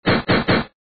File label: Gun Shot